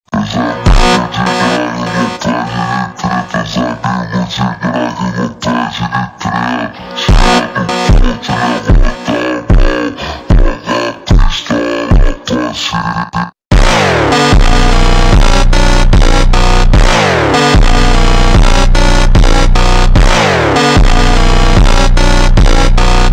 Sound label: slowed and reverb brazilian phonk